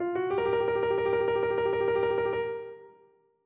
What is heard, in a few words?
Rock Piano